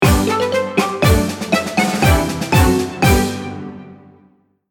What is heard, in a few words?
jingle